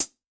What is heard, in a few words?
Close, HiHat; Yamaha DTXpress-II